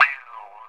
GTR FX, FUNK GTR; E-Lab; X Static Goldmine 2; akai

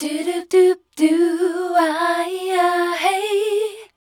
MELODIC #D, Voice

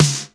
SNR

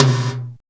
TOM; E-Lab; X Static Goldmine 1

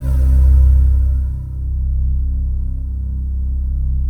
PAD; Best Service; XX Large; Pads

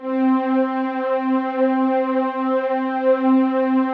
PAD, ATMO PADS; Best Service; XX Large

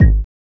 BD; E-Lab; X Static Goldmine 1

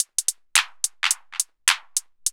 LOOP; E-Lab; X Static Goldmine 2

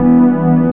PAD

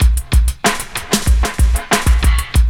LOOP, DRUM; E-Lab; X Static Goldmine 2; akai

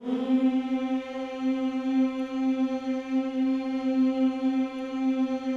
HMMS C 4, Vocal Planet Gospel; Spectrasonics; Vocal Planet Vol.1 Gospel; akai

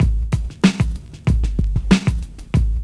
LOOP; E-Lab; X Static Goldmine 2; akai

